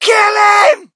crowd, shout; Mike Tyson Heavyweight Boxing

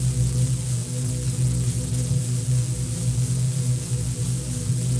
ambience Phat Electrical Hum; Star Wars Obi Wan; XBOX

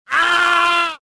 death; jedi

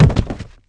Baddie Drop, Falls

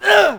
exert; Cars; XBOX

